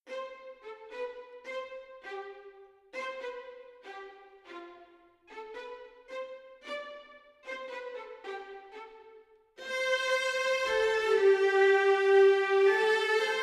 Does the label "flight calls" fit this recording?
No